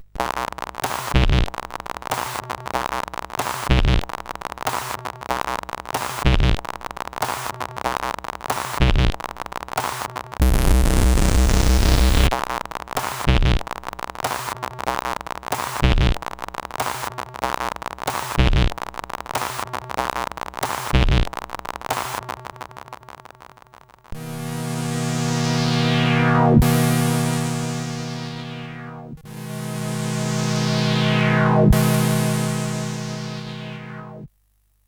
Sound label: sid, CSG8580; Portal tune 2; hvsc